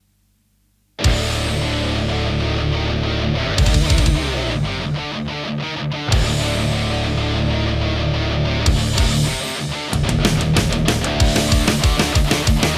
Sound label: Metal, pickups